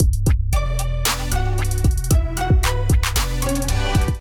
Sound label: Azimuth Kit groove 114 bpm; MIDI Clips; Ableton Factory Packs; Beat Tools